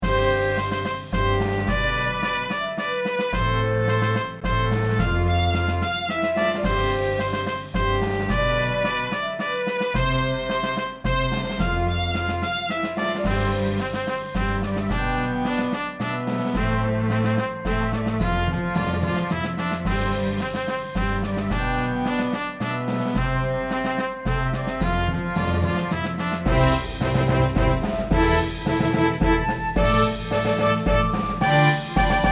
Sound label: Orchestra